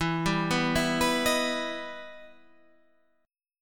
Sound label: E Minor Major 7th E down Guitar Standard; EmM7 arpeggiated